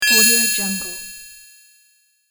casual item pickup

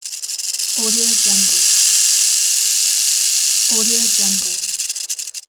snake rattle

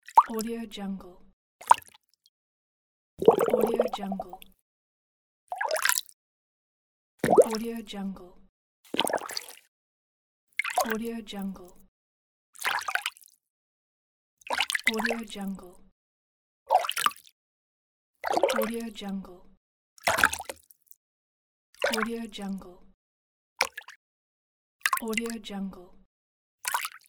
water sound pack

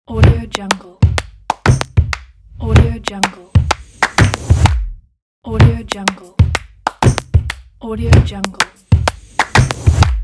fashion clap stomp logo

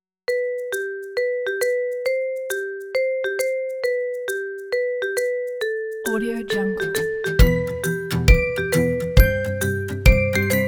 playful happy

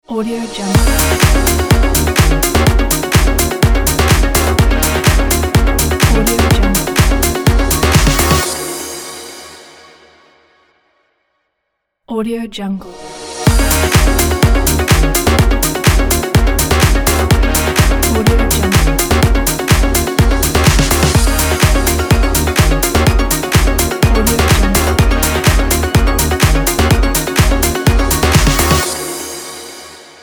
intro pop logo

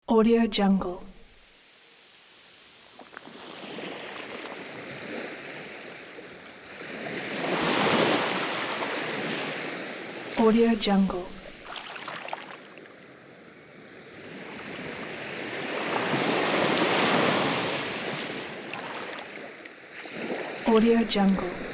ocean waves

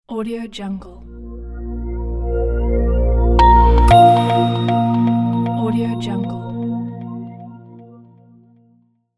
minimal logo

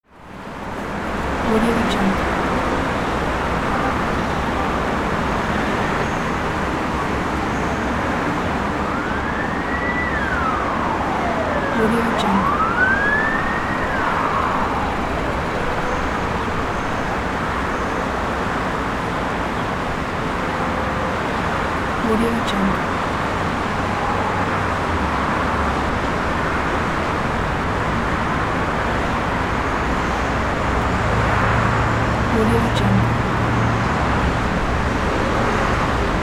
apartment with open windows; sounddivision

